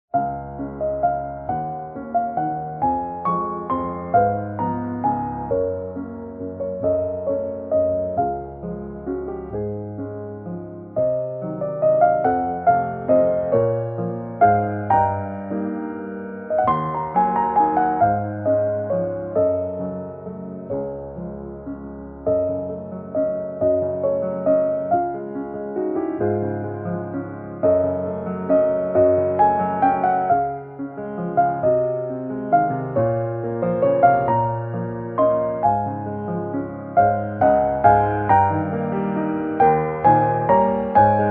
Relaxing Piano cover; Pokémon X & Y

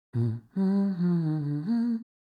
Humming; Link; The Legend of Zelda Tears of the Kingdom

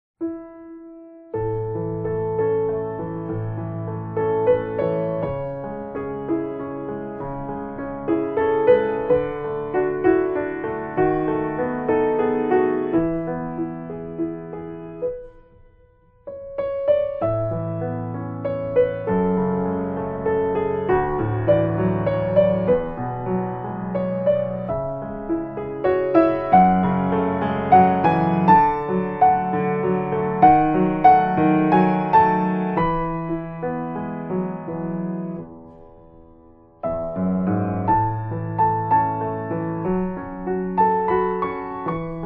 Piano cover; ANIMAL CROSSING‛： New Leaf

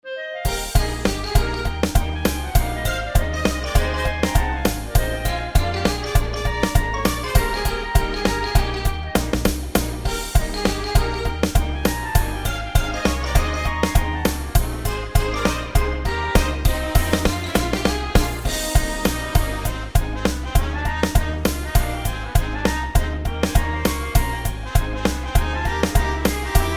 Minigames Menu, Orchestration; Pokemon Stadium 2

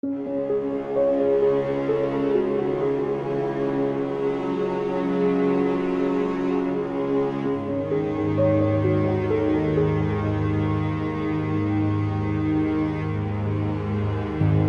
Piano & Strings Cover; Resident Evil 2

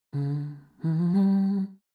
Humming; Link; The Legend of Zelda Tears of the Kingdom

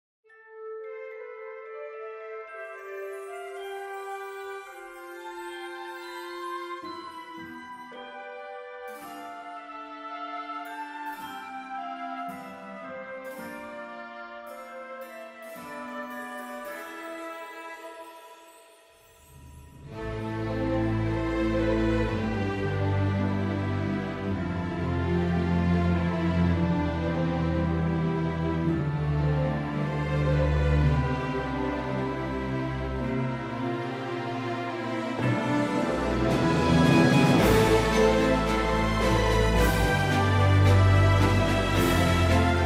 Orchestral Arrangement; Deltarune

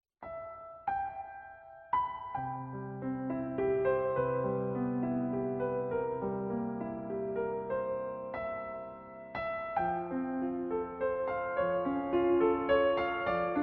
Lullaby Waltz Piano

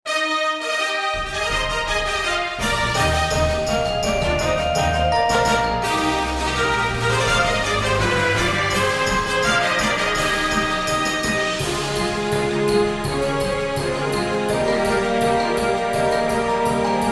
Minigame Championship, Orchestral Remix; Pokemon Stadium 2

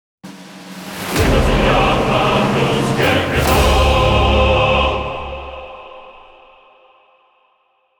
Fanfare; FF-XVI

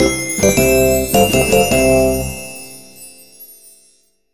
FANFARE; Pokemon Shuffle

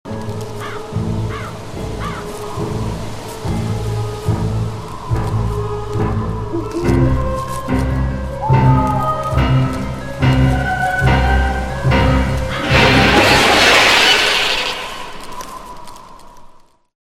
Horror Set Jumpscare Cutscene Music; Luigi's Mansion 3

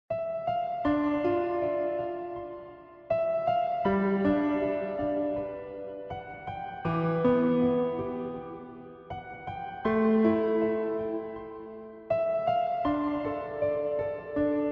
Piano & Strings Cover; Super Mario Galaxy

